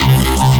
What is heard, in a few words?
Dirty C; Harmor; Generators